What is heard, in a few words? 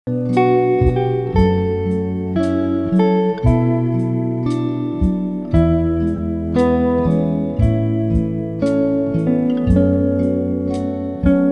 a weepy song on guitar melancholic slow; music fx